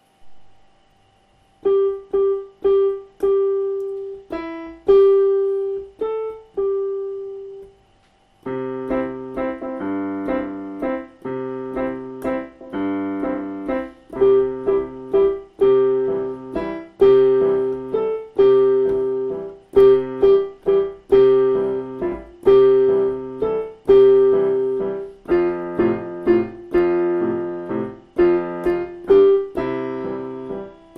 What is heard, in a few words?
piano